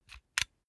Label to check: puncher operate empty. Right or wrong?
Right